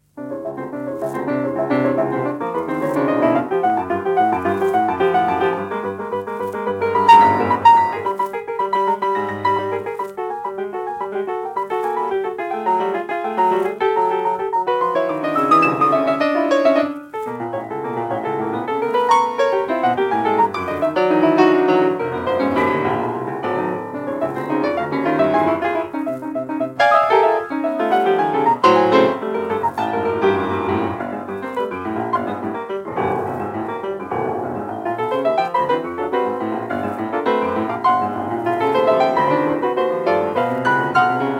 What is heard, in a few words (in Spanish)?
Tres estudios para piano